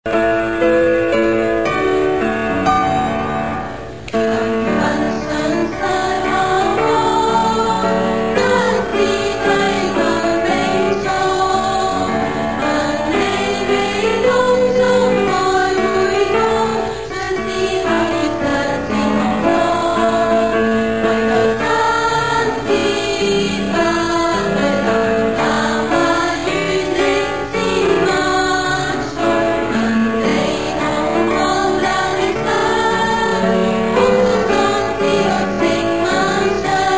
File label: School Song in Chinese